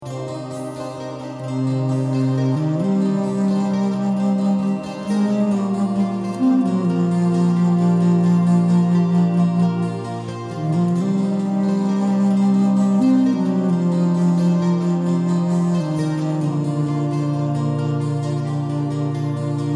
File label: birds contra, Contra Bass Flute; Life of Birds